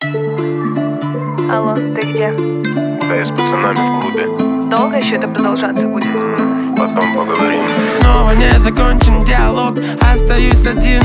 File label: groove